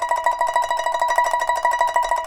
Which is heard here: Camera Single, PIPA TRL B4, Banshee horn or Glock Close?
PIPA TRL B4